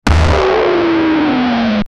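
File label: tnt explosion, retro games; bomberman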